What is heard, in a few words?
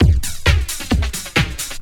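FLANGE LOOP, Techno Trance; E-MU Formula 4000 Series Vol. 2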